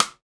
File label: Percussion, House; Best Service; House Definition VOL-1; Drums